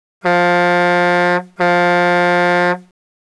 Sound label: Horn; TrueCrimeStreetsOfLA; XBOX